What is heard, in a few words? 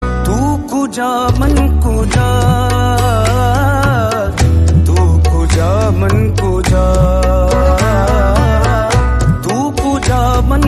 TU KUJA MAN KUJA ISLAMIC